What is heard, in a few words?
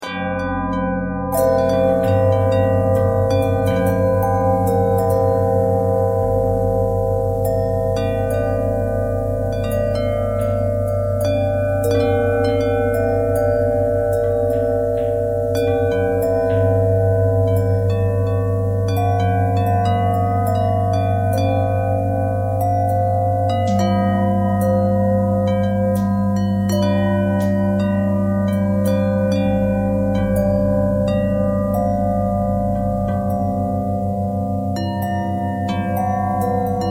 bell harmonic twist; bells